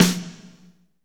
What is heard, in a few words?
SNR, Snares, Roland